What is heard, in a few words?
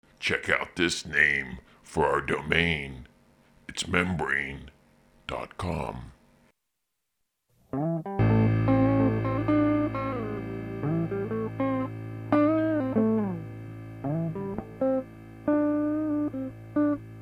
piano